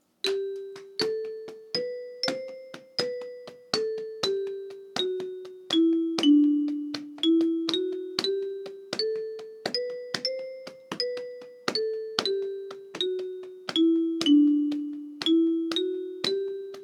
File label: alto exercise, kalimba